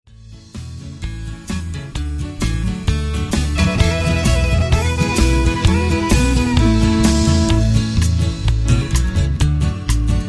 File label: Fiddle And A Guitar Band Instrumental